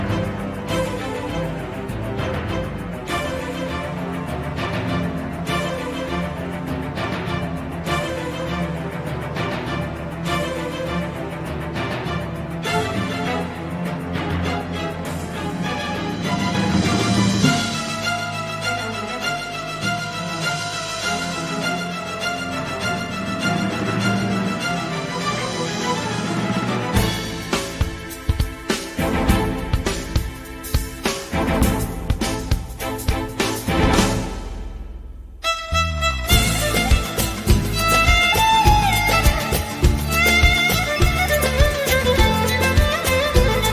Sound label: Violin